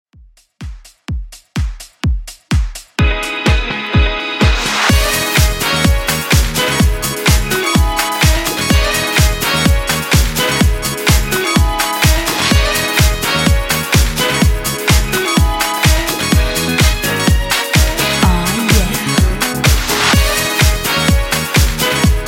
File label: Vocal Club House 126 Bpm